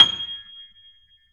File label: G 6, GRAND P; USB Soundscan vol.17